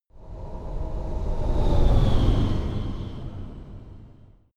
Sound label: sci-fi vehicle train passing; raumschiff